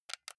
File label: console controller xbox rb creaky press soft